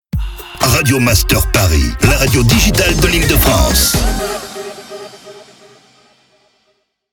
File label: Jingle SPEED; Radio Master PARIS; Habillage